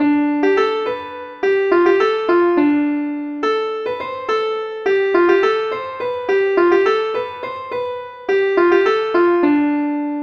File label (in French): valse